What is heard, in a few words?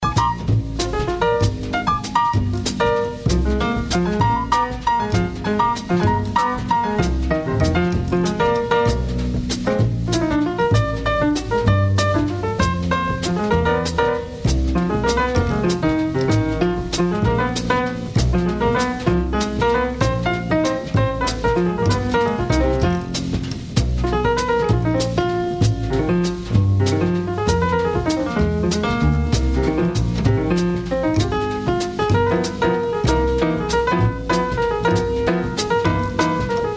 jazz